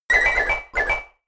tickle

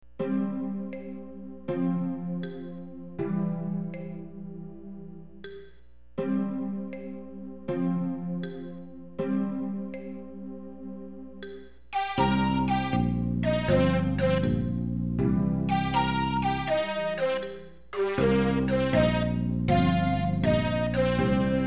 Harbor Hymn; SimCity 2000; PSX

